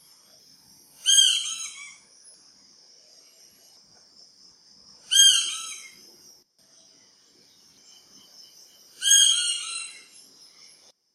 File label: Crimson Winged Yellownaped Woodpecker calls; Kledang Sayong Forest Reserve Ipoh Perak Malaysia